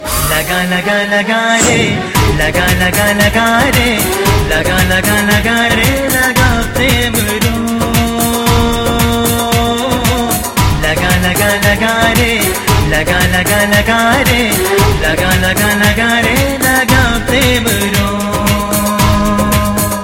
hindi; maine pyaar kyun kiya 2005

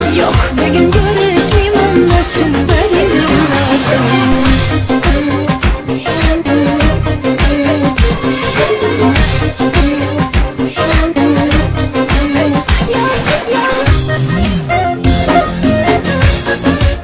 uzbek ringtone

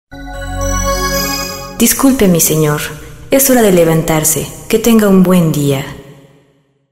sophisticated alarm

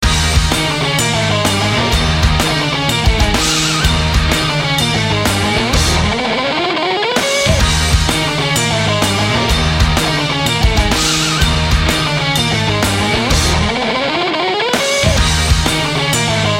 rock music ringtone

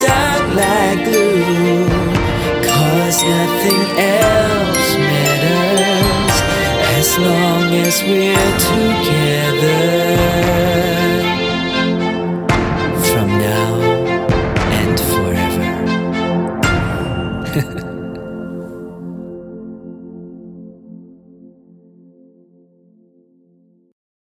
singaporean ringtone

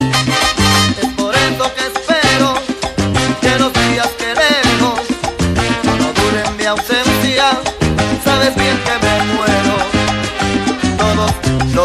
colombian mix

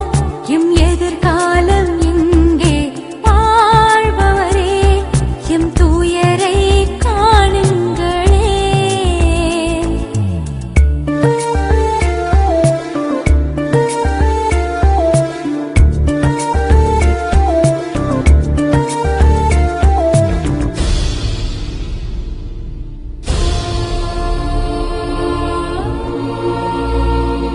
tamil iraqi

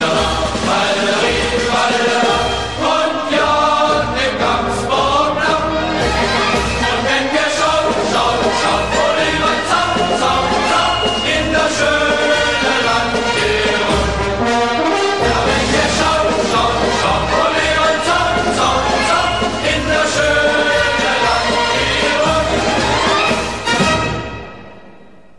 austrian folk song ringtone

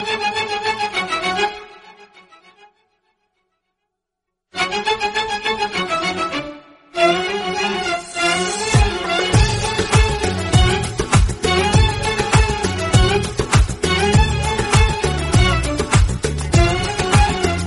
violin guitar irani; ringtone

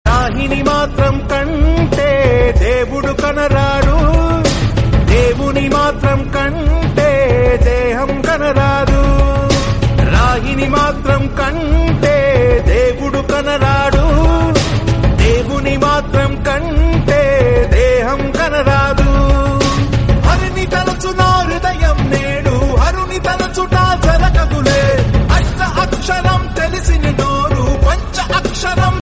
telugu ringtone

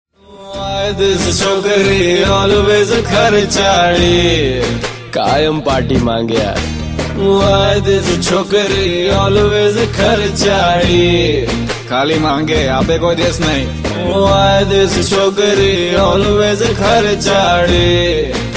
gujarati ringtone

